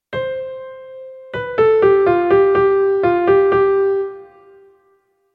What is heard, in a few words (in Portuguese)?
polifonia